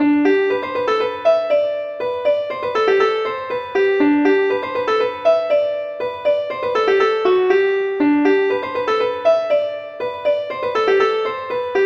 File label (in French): avant deux polka